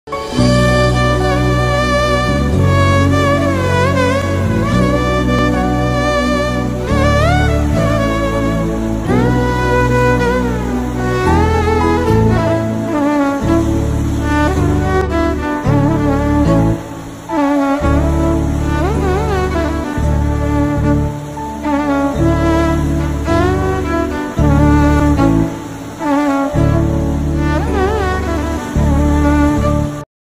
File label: tamil love bgm